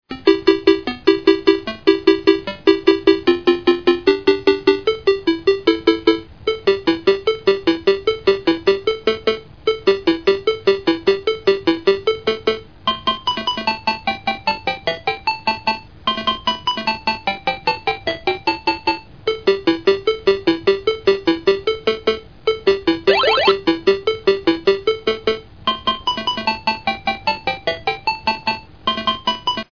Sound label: door; PCgame